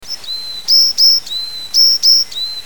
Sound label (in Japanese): yamagara